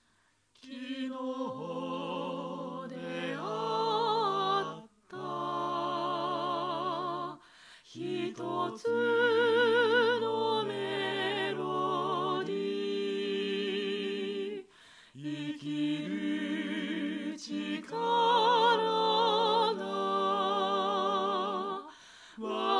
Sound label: soprano part